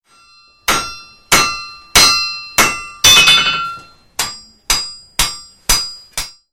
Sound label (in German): Schmiede Hammerschläge